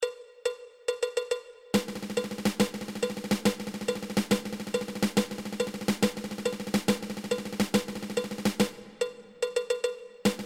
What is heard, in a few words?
Ten Stroke Roll